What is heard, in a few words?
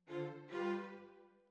Violas, jazz for piano